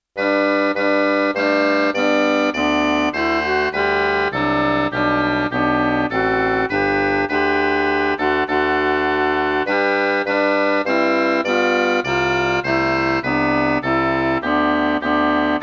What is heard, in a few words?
Joyful joyful we adore thee